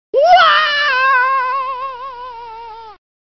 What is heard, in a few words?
fall ringtone